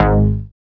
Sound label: BASS, Synth Bass; SYNTH-Basses; Sound & Vision; Gigapack I CD 2